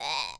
Sigh Out Tongue

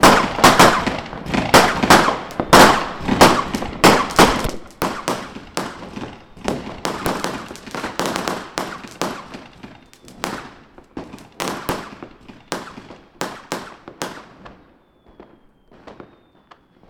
Strange Sounding Firework Rocket Explosions

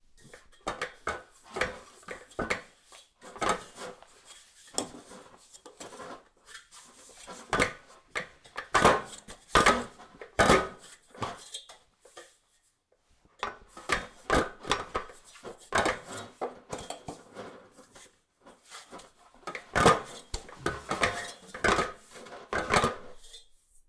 Climbing Ladder

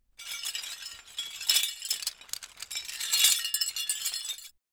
Medals Clanking

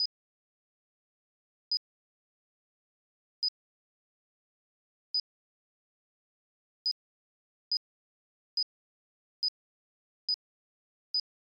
Beep Bomb Countdown

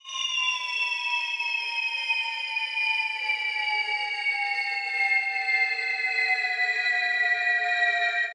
Bling Falling

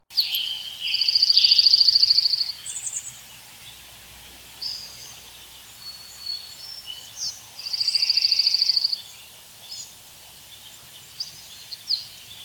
Birds Sing In Woods

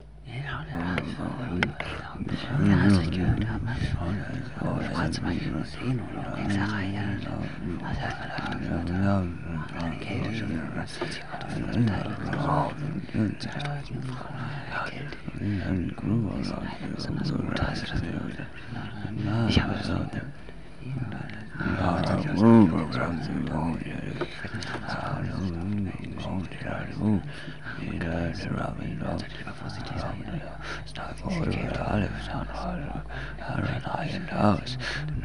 Murmur

